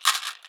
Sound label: Pills